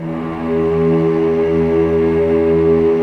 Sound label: STR, Vcs p Amb